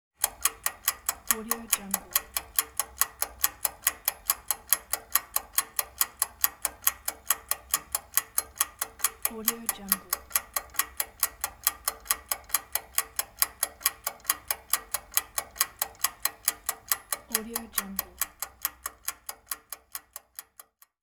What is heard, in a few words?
clock ticking